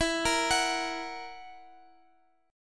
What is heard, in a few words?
E Suspended 2nd Flat 5th E down Guitar; arpeggiated; JGuitar